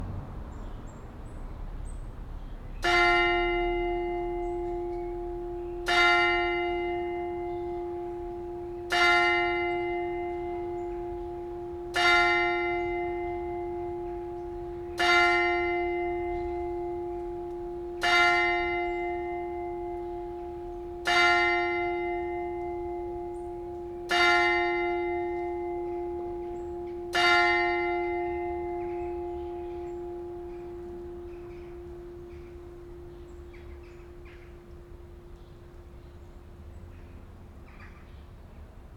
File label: Church bell 9pm